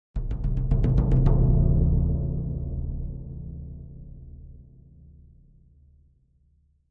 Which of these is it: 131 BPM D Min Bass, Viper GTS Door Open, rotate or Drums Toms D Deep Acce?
Drums Toms D Deep Acce